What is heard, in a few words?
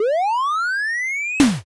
defib